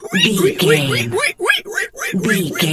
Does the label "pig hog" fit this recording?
Yes